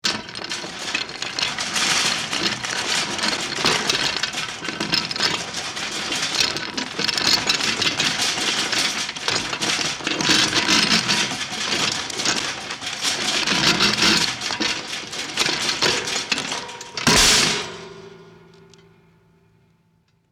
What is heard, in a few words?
Gate Metal